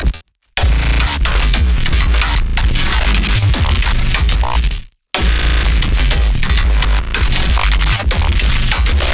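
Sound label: random bass loops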